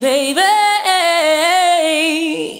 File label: "baby" female SUNG; ooh ahh